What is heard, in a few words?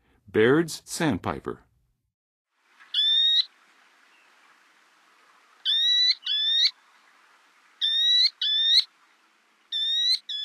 Bairds Sandpiper; North America; Western USA; Western USA Songbirds